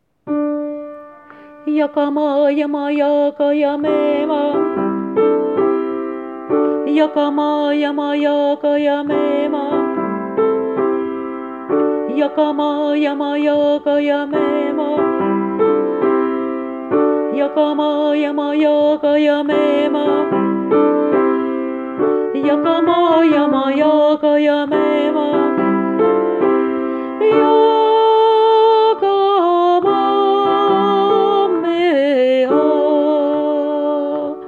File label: Soprano